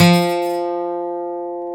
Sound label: GTR 6-STR, Steel String